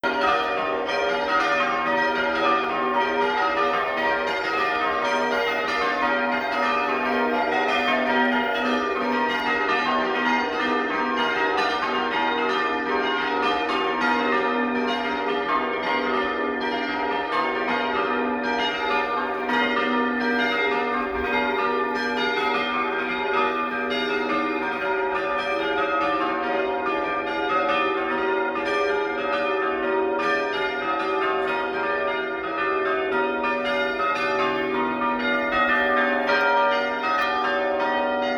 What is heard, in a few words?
Last 2 minutes of bellringers peal